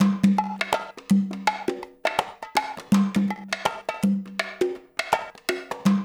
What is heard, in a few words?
loop, percussion; InVision Interactive; Percussion Slam; PRIMADONNA